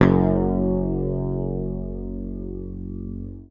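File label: bass, F#1, left channel; PICK; Sampleheads; Will Lee Bass Library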